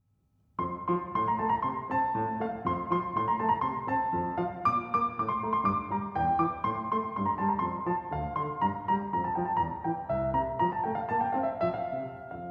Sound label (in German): klavierstuck f